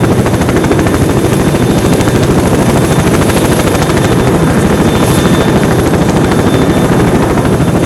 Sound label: seaknight idle; veh seaknight idle